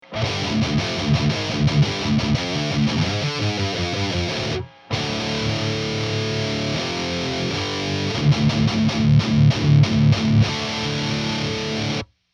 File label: riff herbert mod; diezel herbert dekompression mod; killswitch